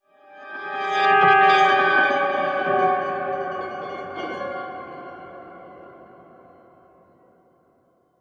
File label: Quirky Piano Keys